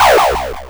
loop

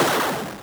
shotgun, weapons

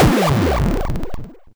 odd, explosions

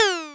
impact

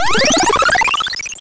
portal; movement

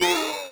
screams human

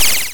laser, weapons

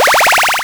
powerup

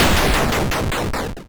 cluster, explosions

